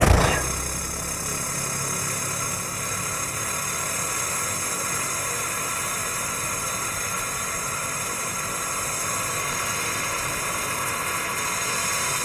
Steam Engine Start Idle Off, Trains; Vehicles

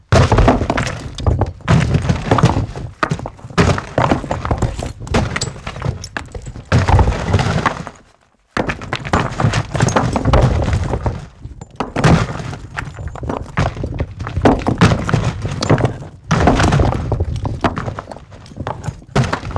Rockslide Boulders Tumble

